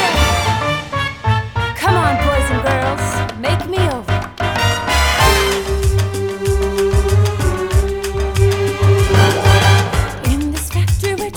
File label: Mambo